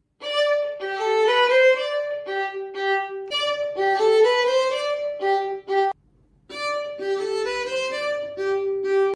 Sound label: Fiddle